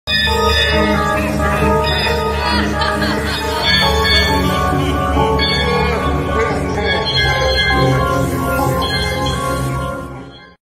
laughing; Majin Sonic

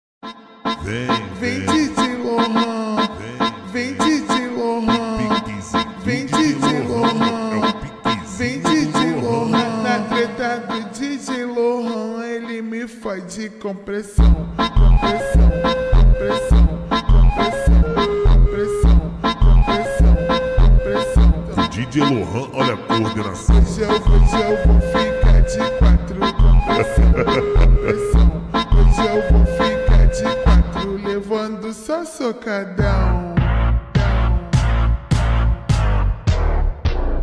speed up brazilian phonk

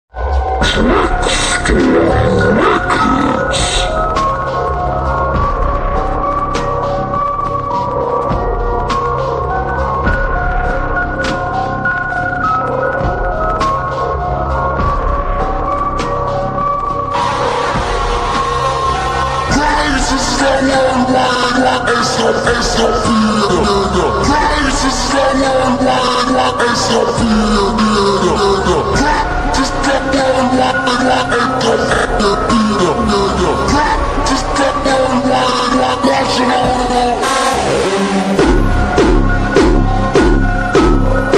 ultra slowed and reverb

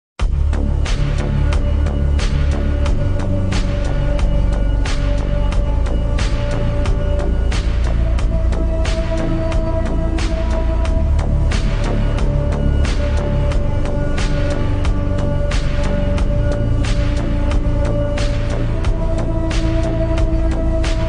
super slowed reverb

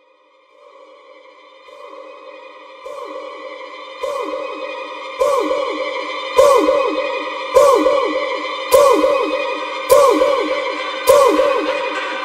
brazilian phonk

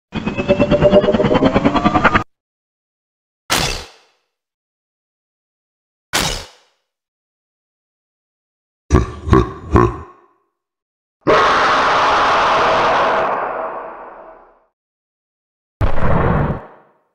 toilet sound

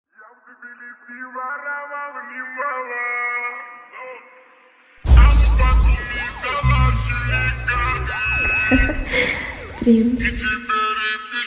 Azeri bass music

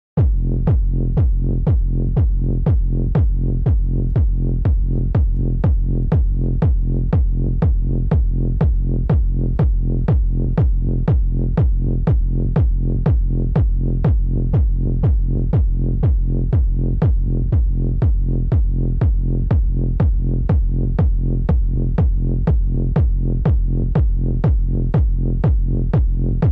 remix slowed reverb

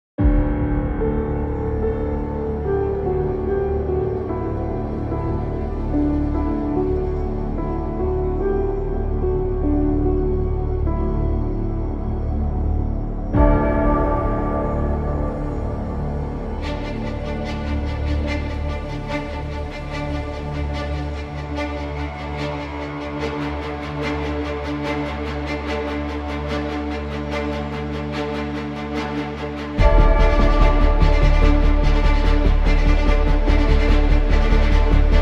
epic version anthem; Top Gun

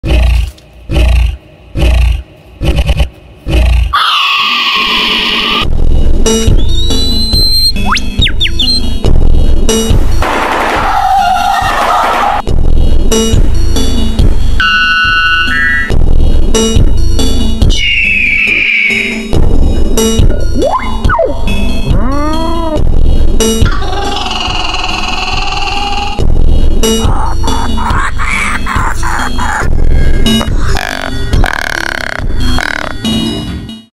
funk oscuro slowed

